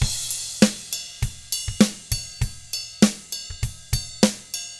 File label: ROCK, Drums